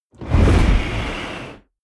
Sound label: organic curtain, whooshes; whooshes rises